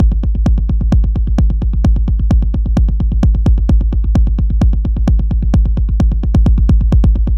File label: 130bpm Kicks